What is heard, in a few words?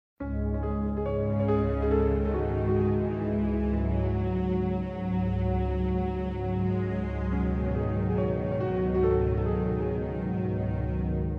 piano; resident evil